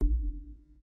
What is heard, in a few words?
fly out